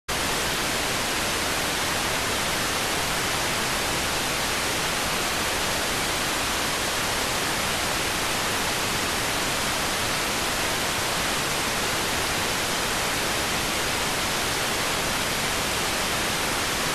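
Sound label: radioteletype receive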